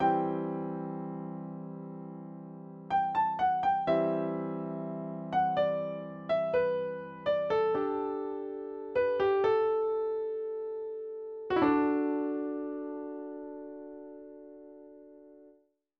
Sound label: instrumental